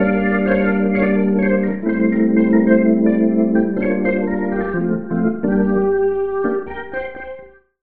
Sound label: Rotary Speaker Gyratory Swooshes Organ Wet; AmpVibe; Syntheway